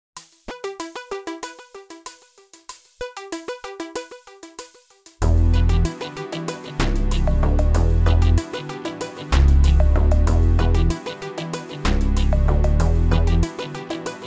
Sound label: hiphop